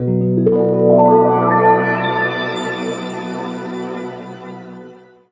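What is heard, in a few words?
B pitch shift wah, Guitar Samples; East West; Steve Stevens Guitar Samples Collection; PTCH-SHFT+WA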